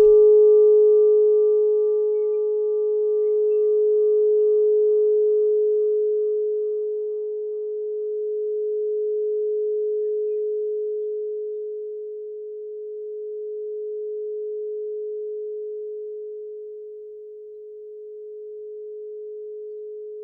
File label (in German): klangschale nepal, Klangschalen Nepal